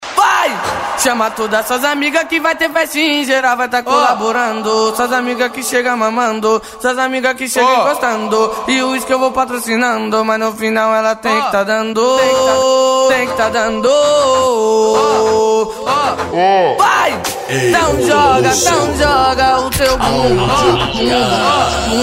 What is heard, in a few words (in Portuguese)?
especial funk